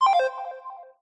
Sfx Basketball

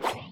Shuriken

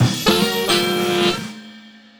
Rank Up Jingle; machi koro with everyone; switch windows

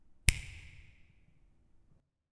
Snap

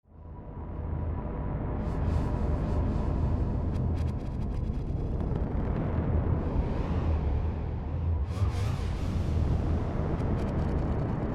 Atmosphere

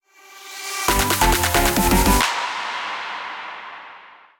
Opponent Found; Pokémon Trading Card Game Live

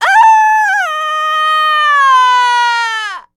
Enemy Generic Human Death Falling

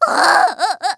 Seed Cry

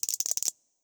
Crab

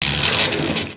Damage Lightning; Knight Online

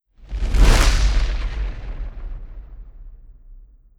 Rock; Legend of Keepers; Engineer

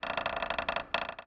Windmill Creak

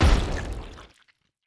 Explosion Wet

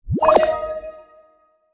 Achievement Notification; gamerip

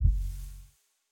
Photo General Reveal

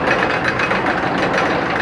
Chain Lift

